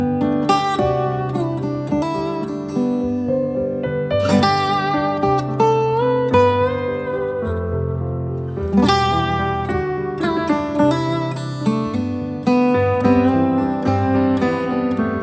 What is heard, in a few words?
music box